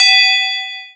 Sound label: bell, water balloon; Birthday Party Bash; Wii; Spanish